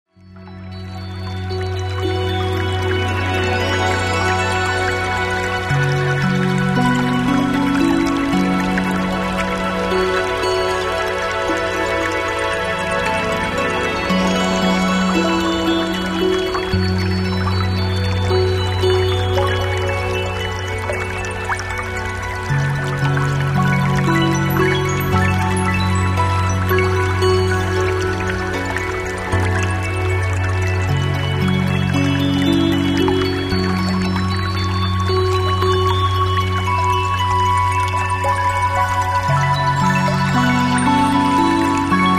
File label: Singing Water